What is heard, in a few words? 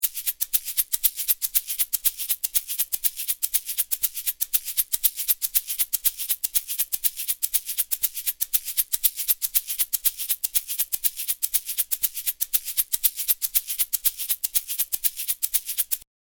shaker